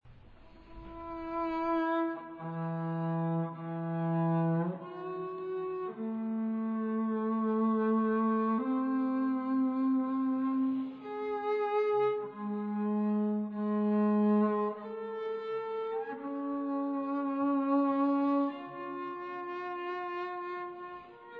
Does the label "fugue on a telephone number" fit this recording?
Yes